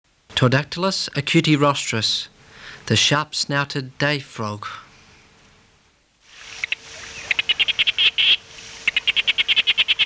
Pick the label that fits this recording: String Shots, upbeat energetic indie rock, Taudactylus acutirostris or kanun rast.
Taudactylus acutirostris